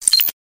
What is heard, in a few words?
Sci-fi glitch futuristic rapid